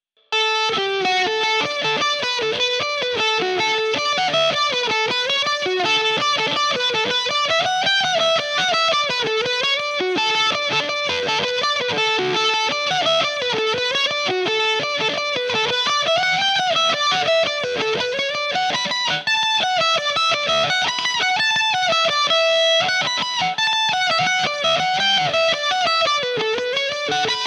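distorted jigs, Revelation Jaguar electric mandolin; The Connaughtman's Rambles/Tiocfaidh Tú Abhaile Liom?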